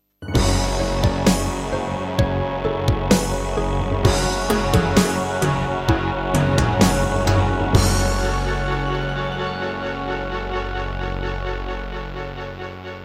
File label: Introduction, Serdaco Dreamblaster X1; Doom (Sigil)